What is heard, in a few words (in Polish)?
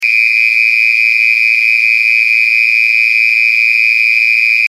Ton